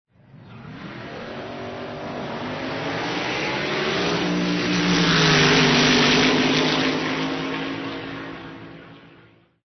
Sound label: AUTO RACE